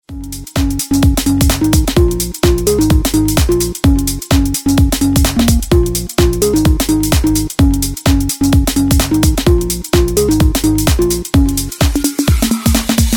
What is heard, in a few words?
organ, moh; Original Organ; music on hold